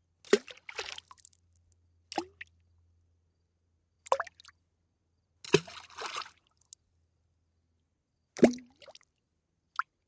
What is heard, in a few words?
Splash Sml Stone Plop